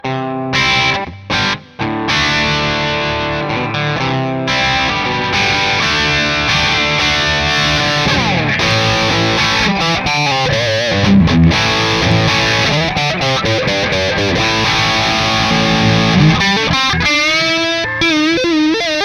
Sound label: KPA JCM2K; Kemper profiles